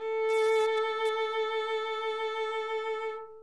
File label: violin, vibrato